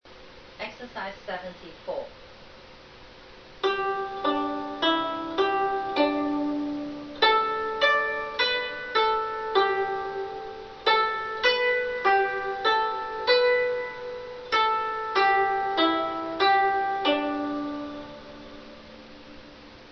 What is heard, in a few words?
zheng